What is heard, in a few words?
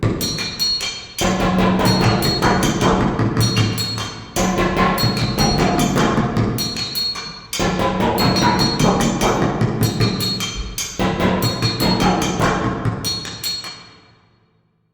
Music Exterior Song; Vent Kids; Skeg